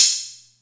Atlantex Music Percussion Computer Cymbal Short, drum machine